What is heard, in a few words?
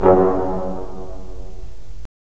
BRS, Roland LA Composer